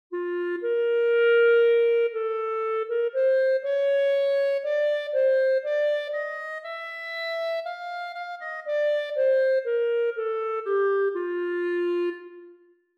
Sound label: Mel dict chromatic long